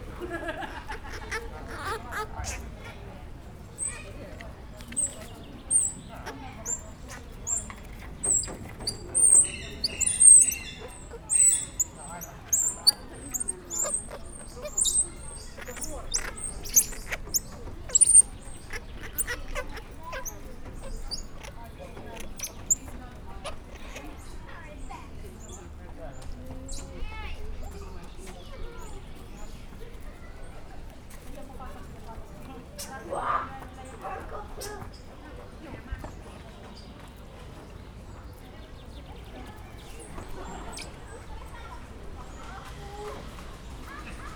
csendesedő környezet, mókusmajom; pecsi zoo 2017

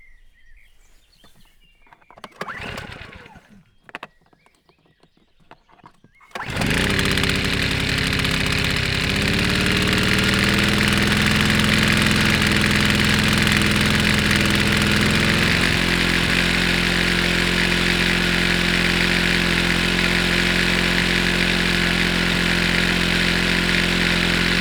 benzines generátor; vízház; cardioid

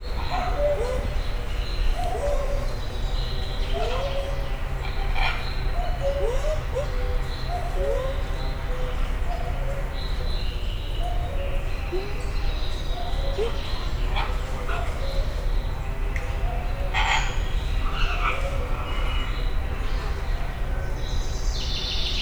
a végén hangosabb, erdei kutya; szegedi zoo 2011

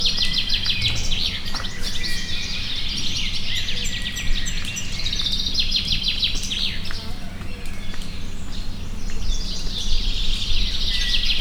hangulat a karámnál, rénszarvas; miskolci zoo 2018

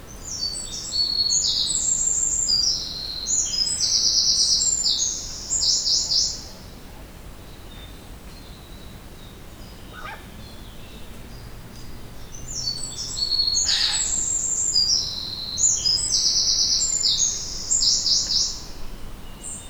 ökörszem a kedves hangocska, el követői a szajkók; termeszetben; perkupa; allathangok

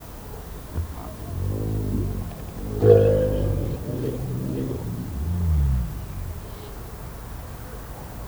egypupu teve szelvedo; állathangok; nyíregyházi zoo 2010

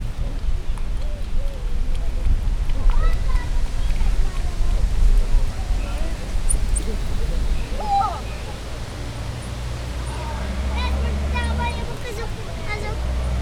néhányat lefetyel zúgó szél, ormányosmedve; Győri Zoo 2014

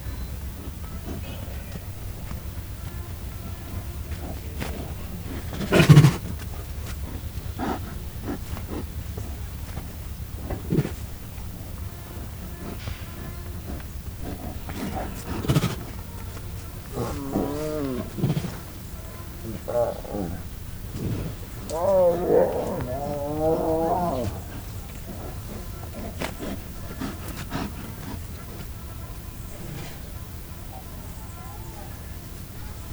kerítésnél, bengáli tigris; miskolci zoo 2009

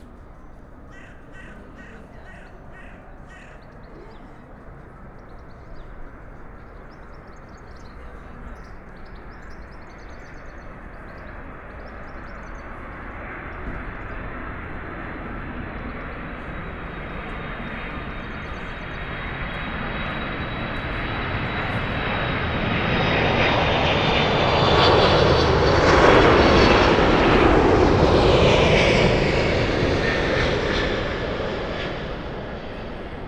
ereszkedő gép madarak

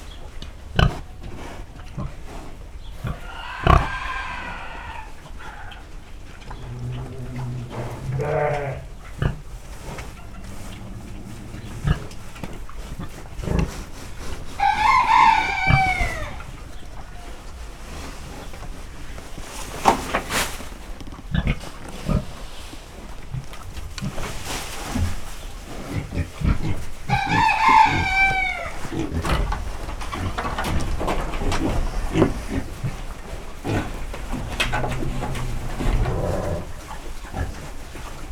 röfögő és dörgölődző disznó birka kakas; állathangok; paraszt udvar; istálló

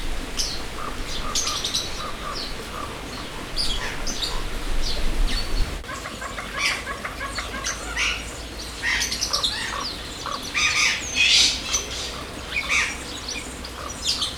tokó kotyogó hang, piroscsőrű tokó; jaszberenyi zoo 2016

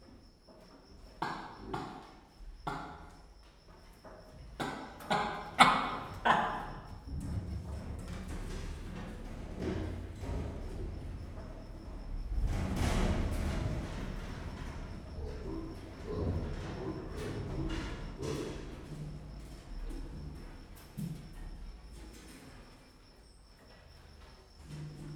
szóló, gallérospávián; gyori zoo 2014

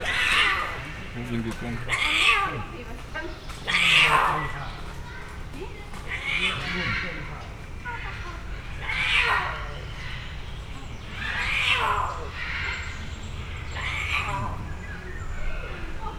intenzív hangadás dél-amerika oroszlánja, puma; szegedi zoo 2011

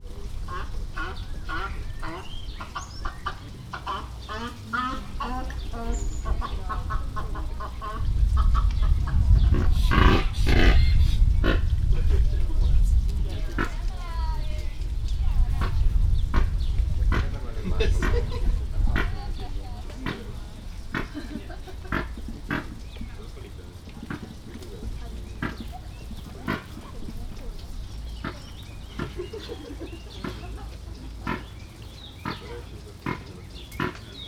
szent íbisz gödény hosszasan fiatalok; allathangok; veszpremizoo 2013

